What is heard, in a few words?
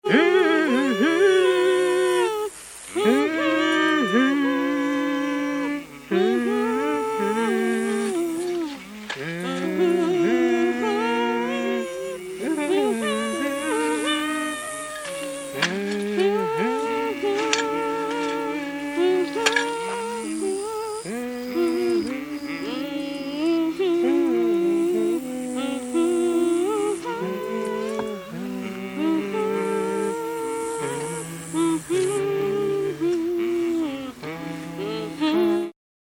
Field Workers Humming, Deep south females humming as they work; Slaves; Singing